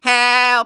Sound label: help aaa